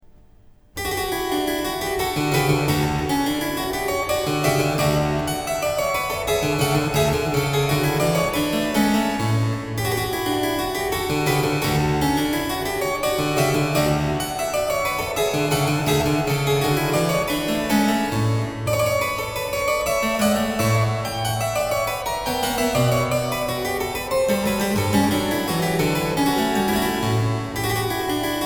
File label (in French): Suite En Mi Majeur Petit Menuet; BWV 817